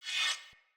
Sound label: Polearm Whoosh Accent; Weapons; Player; Sphere